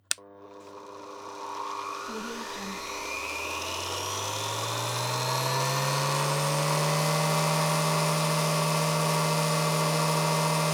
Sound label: bench grinder motor; stormwaveaudio